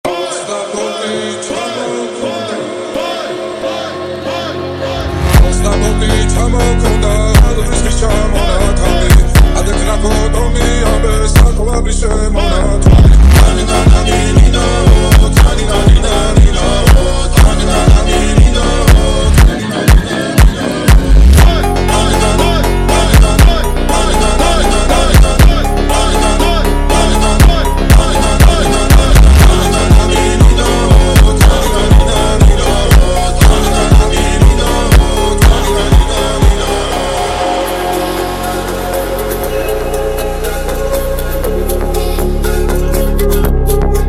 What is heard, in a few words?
Funk slowed, Georgian Folk